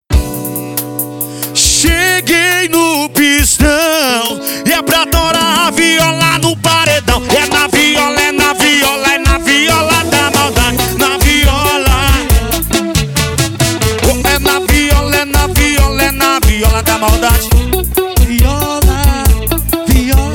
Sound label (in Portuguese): viola